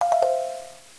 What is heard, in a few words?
Bell in; Apache; Slide Sounds